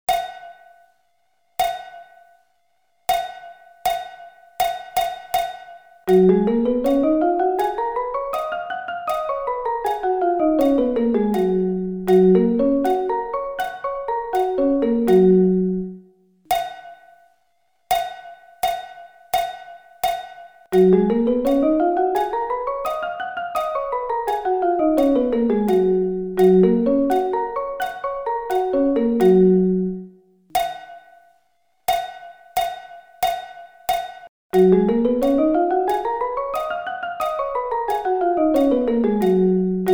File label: Gb Scale